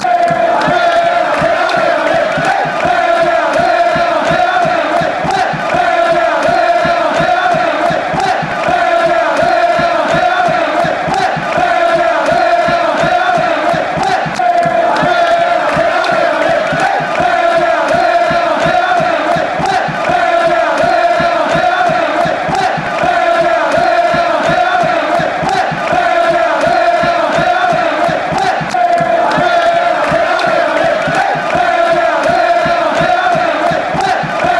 allez allez allez he, fan chants

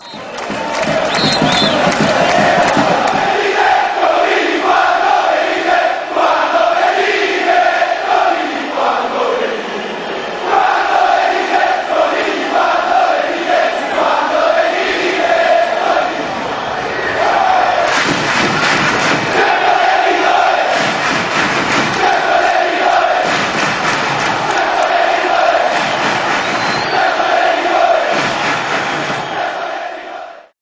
how much fan chants

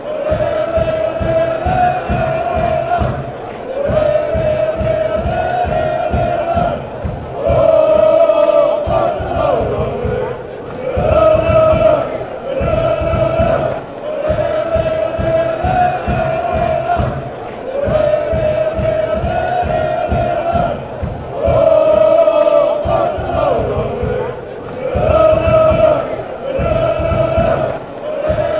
ole ole wooaaah, chants

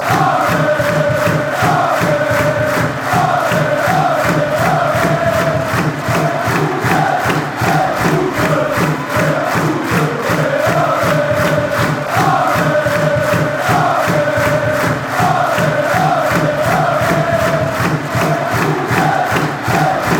ole, fanchants; leverkusen

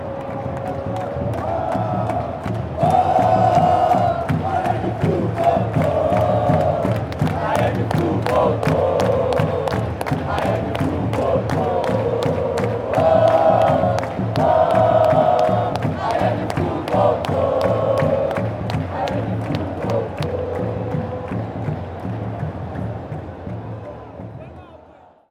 young flu voltou fanchants

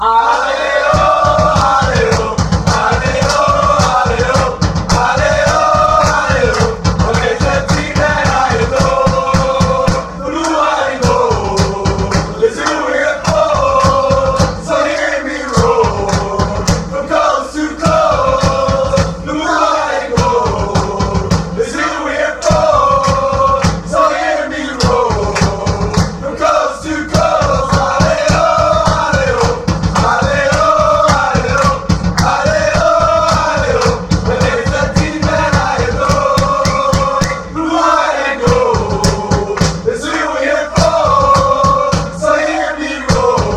aleo aleo, fan chants

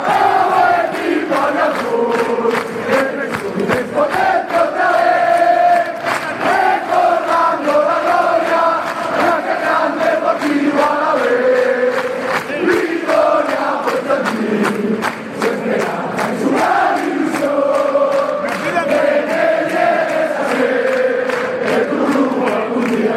himno fan chants; alaves

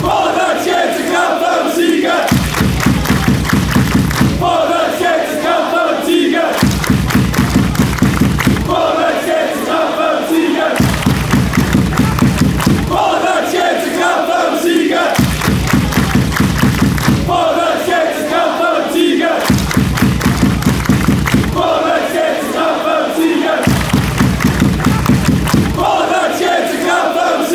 fight and win, fan chants; grasshoppers

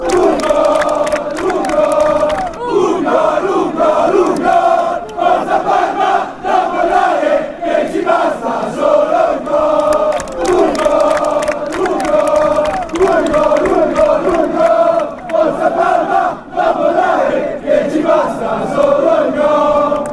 a goal, fan chants

